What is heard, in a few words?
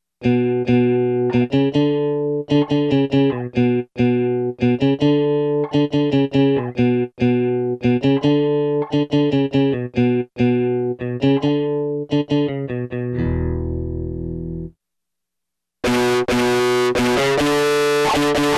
DISTORTION, Yamaha REX50